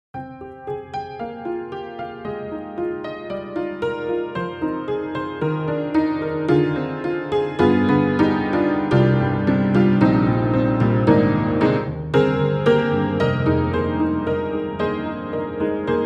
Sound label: Upright Pianos Barroom Upright Soft Barroom Upright; Synthogy; Ivory II